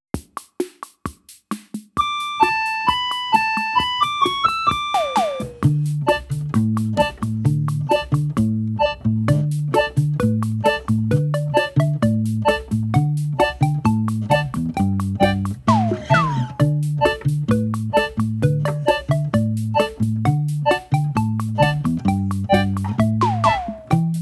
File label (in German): Karaoke Morgen Kinder wirds was geben